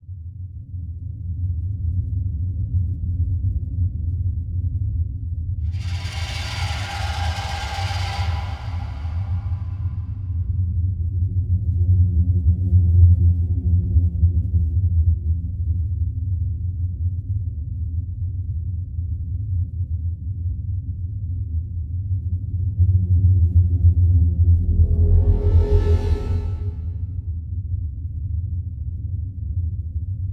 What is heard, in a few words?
Arcade Music